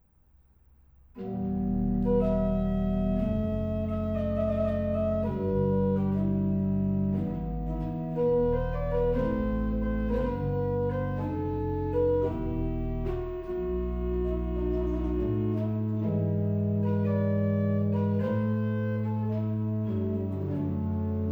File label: Organ Concerto; C. Adagio